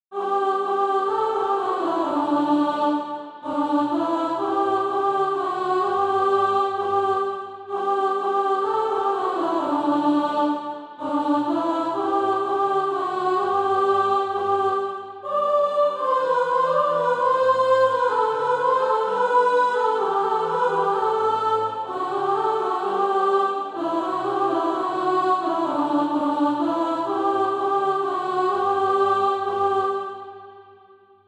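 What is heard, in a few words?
soprano, SATB Practise Music; Ding Dong Merrily on High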